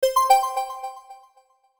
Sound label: Complete; TeraCopy Pro 3.26